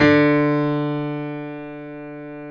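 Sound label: grand piano; B-GRAND PF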